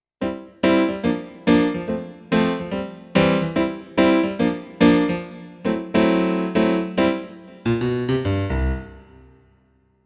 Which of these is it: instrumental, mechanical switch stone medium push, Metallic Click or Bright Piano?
Bright Piano